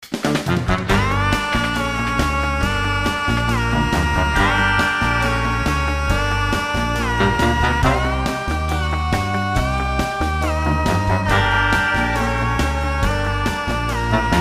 comedy rock crazy

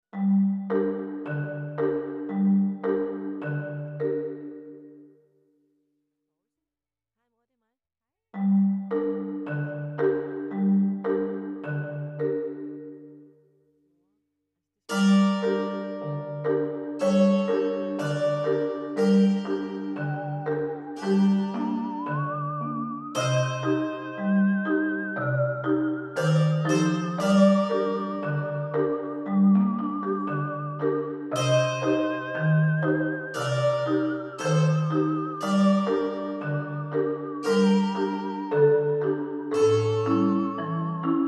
comedy greek

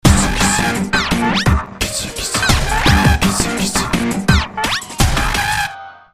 indu power short

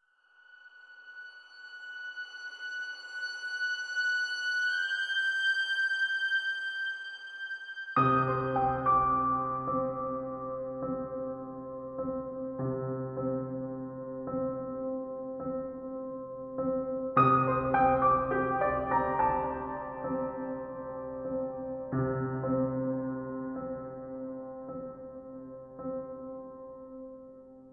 theme drama pain, soundtrack

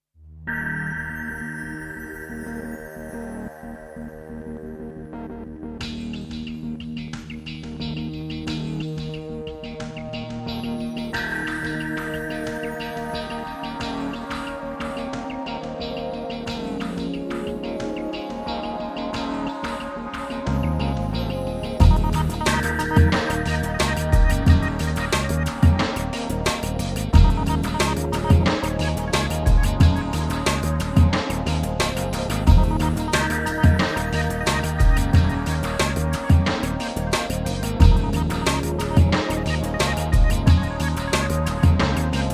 Good Vibes, slow

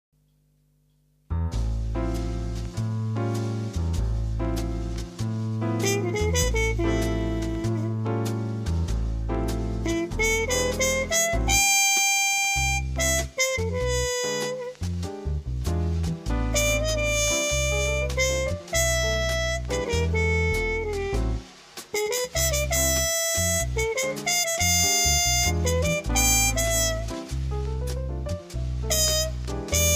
Jazz, medium; Detectiv Jazz

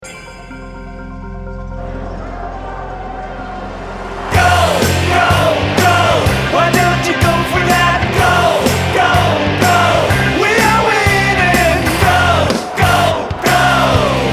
Vocal

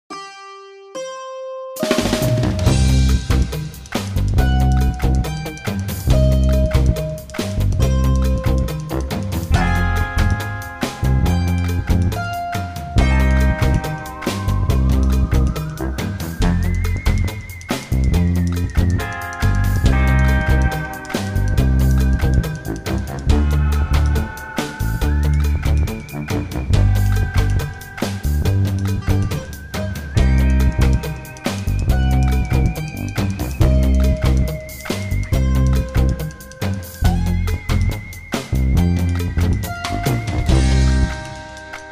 rock greek touch, medium

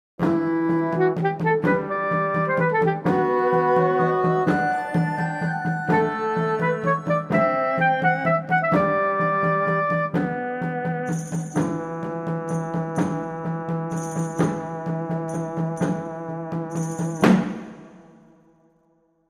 comedy historic straight end

